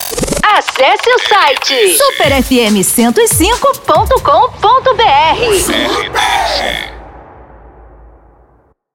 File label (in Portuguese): intercom